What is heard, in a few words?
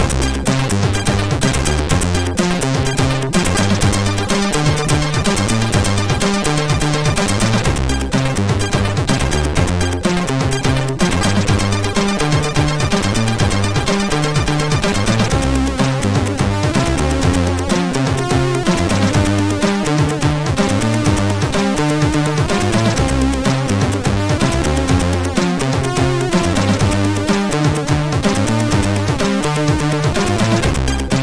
Funky Balade, CSG8580R5